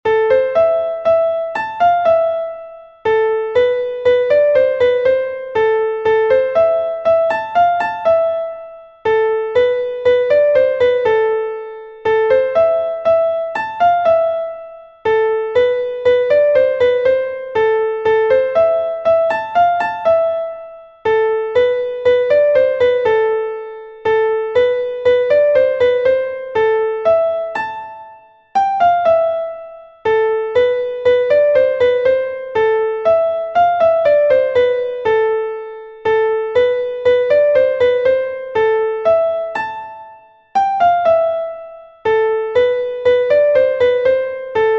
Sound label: mazurka; Septembre